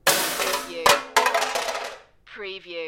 Metal Crash Drop Debris Settle

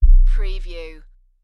LFE Hit

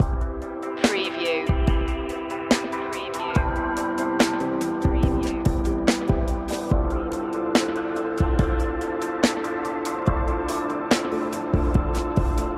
emergent lights loop

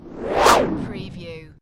Servo Panel Sound - Swish

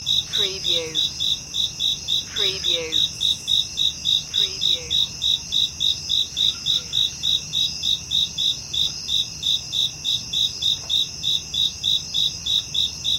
Crickets Insects

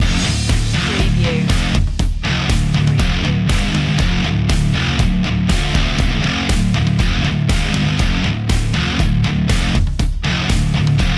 pump action loop

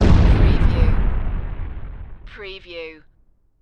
Explosion; lynne publishing

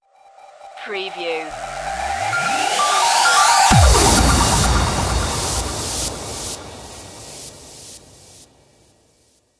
sonarp stinger

